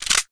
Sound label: sticky bomb launcher bolt back, weapons; tf